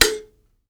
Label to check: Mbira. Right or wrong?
Right